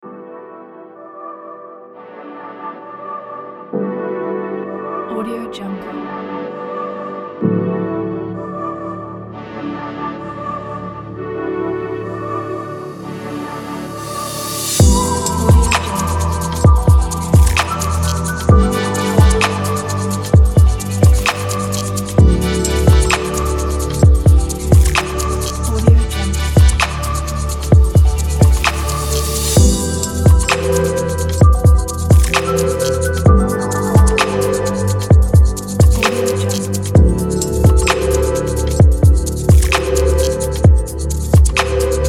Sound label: future ambient background